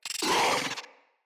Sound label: Infested Charger Alert